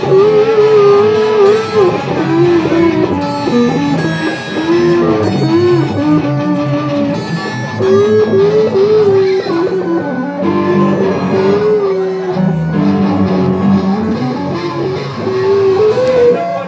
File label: a bunch of kids playing guitars; at guitar center on 14th street